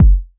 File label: Kick, Kickdrum; Equipped Music; Loungin House